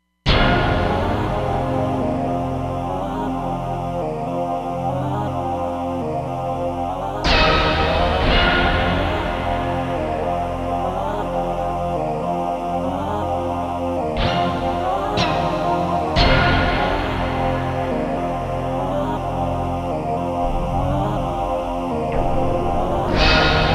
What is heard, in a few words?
Vocastic, Roland Fantom XR